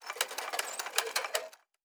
Metal Tools; Sci-Fi Sounds

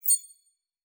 Digital Click, Sci-Fi Sounds Interface

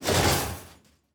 Metal Foley; Sci-Fi Sounds

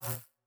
Device Stop, Electric

